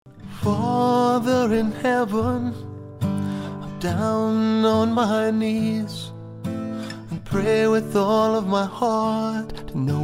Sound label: Original Vocal Guitar